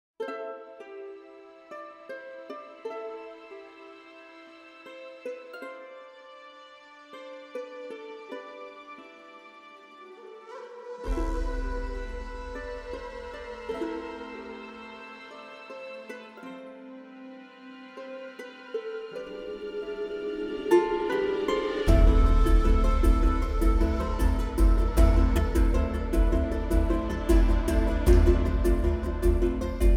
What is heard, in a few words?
south america series orchestra, marimba de chonta; tribe instruments